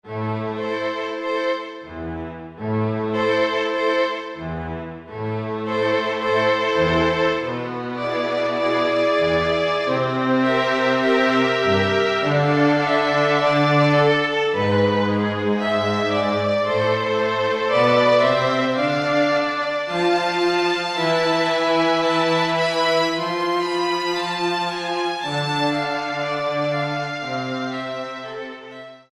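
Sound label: Sad Violin Section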